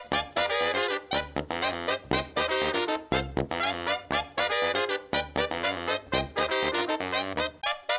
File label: Horns Section